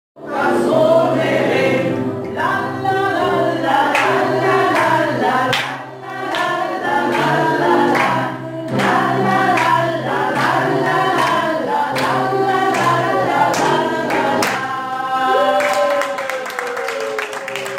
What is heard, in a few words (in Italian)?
canto libera